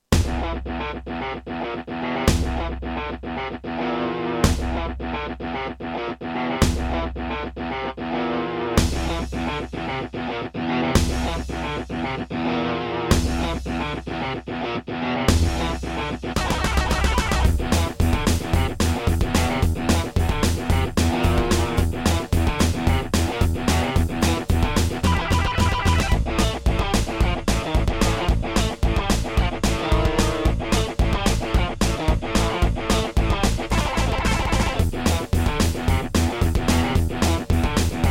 Roland SonicCell GM2TEMPLATE; Doom

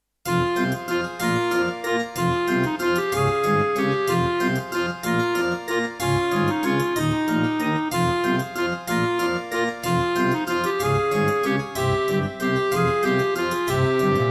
Track 6, Korg Audio Gallery AG-10; Death Gate

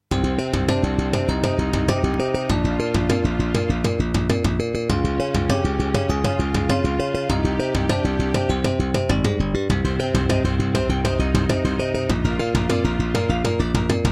Trust Korg Super Sound Module; Introduction; Hocus Pocus

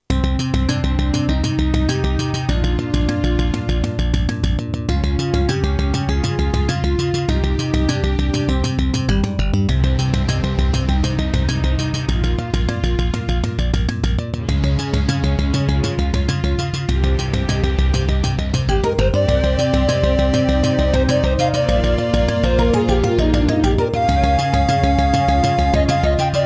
Roland SonicCell GM2 TEMPLATE; Introduction

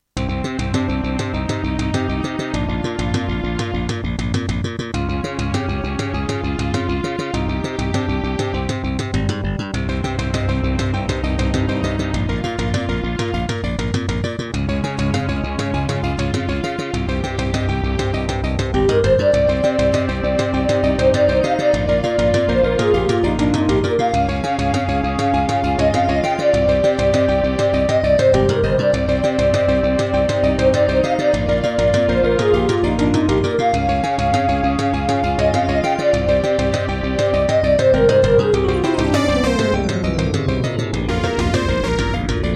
Roland SD-50 GS MODE; Introduction; Hocus Pocus

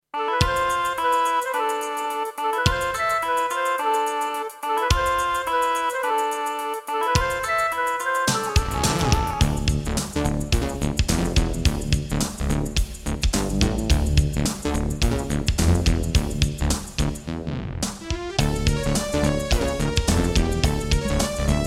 Creative SB AWE32; Lost Vikings

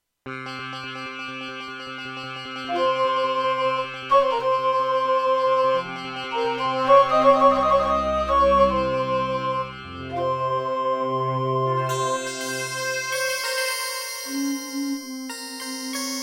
Gravis UltraSound Extreme; Prince of Persia 2 - Intro

